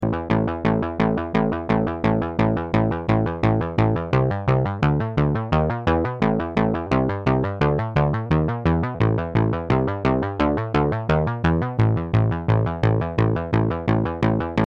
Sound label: MS-20 micro sequence; simulation de micro-séquence à 2 notes (il peut le faire, et tout seul !); Démo audio des fiches didactiques de sons analogiques détaillées dans le livre